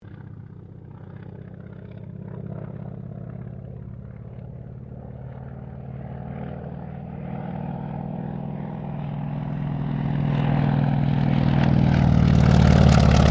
AIRCRAFT CA-28 CERES PASS; Airplane; Airplanes; Vehicles

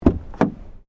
Car Ford Focus Door Front Open

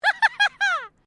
FEMALE LAUGH EXCITED

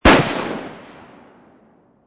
M40 Sniper Rifle Field; Field Fire; Rifles; Guns; VRSonic

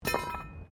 METAL SPIKE FALL; Materials

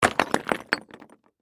Crash, Rock, Debris, Small, Dump; Materials; Rocks; BlastWave

